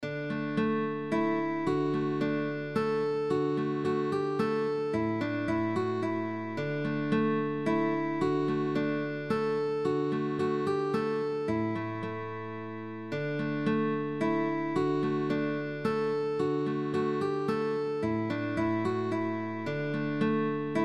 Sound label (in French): MENUET; DE VISEE